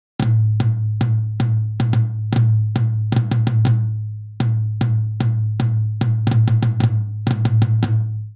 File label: Polyrhythmic Dance Mid Tom Only 114 bpm; MIDI Clips; Ableton; Factory Packs; Drum Booth